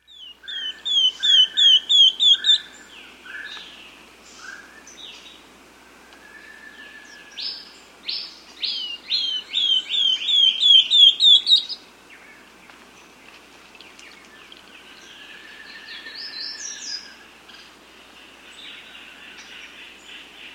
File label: Rufous Gnateater; S. America